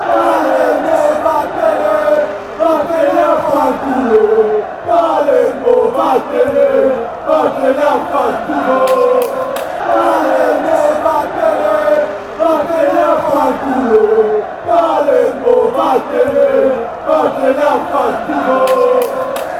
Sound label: palermo fanchants